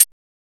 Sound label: TMB